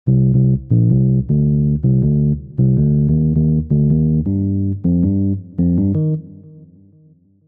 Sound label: Bass